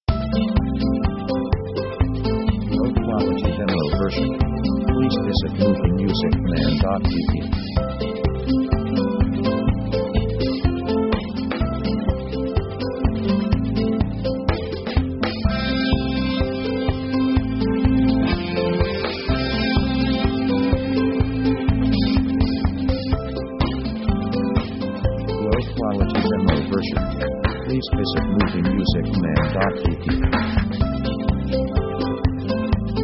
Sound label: background